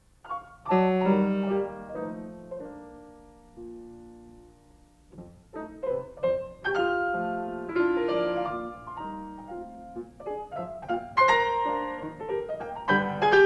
Carnaval for piano; Schumann; Op9

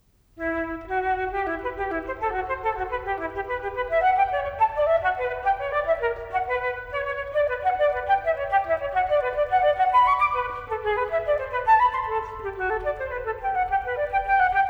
Solo Flute; Telemann; TWV40-9

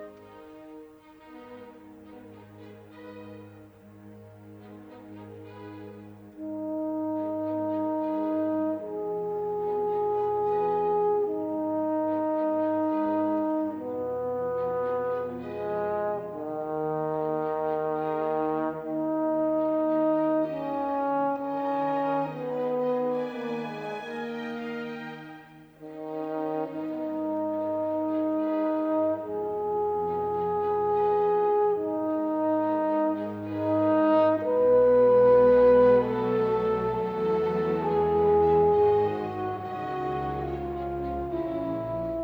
Horn Concerto; R Strauss

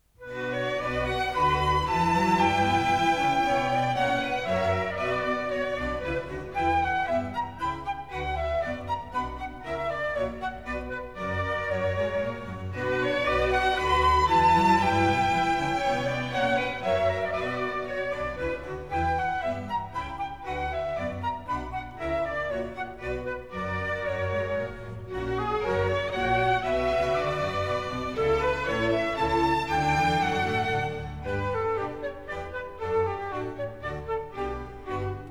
Bach Orchestral Suite; Orchestral Suite No2 BWV1067; Munchinger

